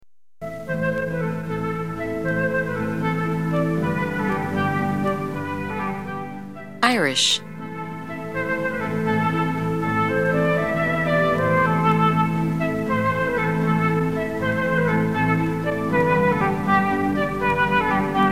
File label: irish, music